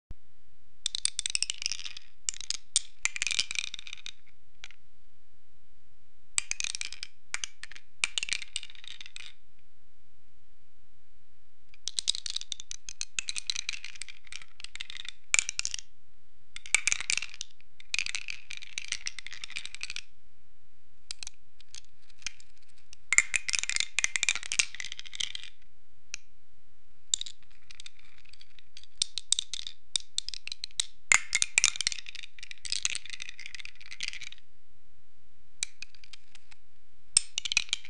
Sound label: playing d, dice; charlei chaplin